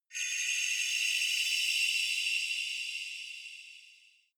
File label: shot shining mysterious lights, swooshes swishes